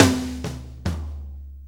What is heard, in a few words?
6-8 Fill, Drum; 6-8 Loops; Fills; Drum Tools